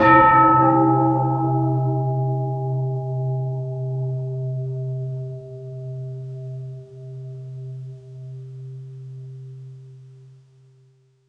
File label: large temple bell strike